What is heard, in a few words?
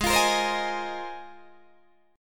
G sharp 6th Add 9th, Guitar, Open D